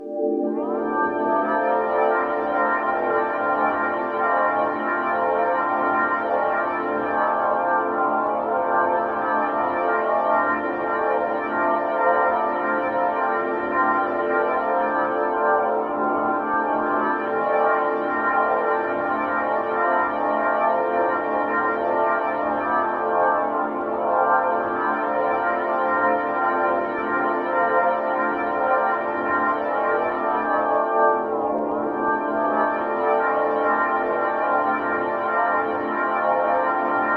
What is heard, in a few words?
ambient intro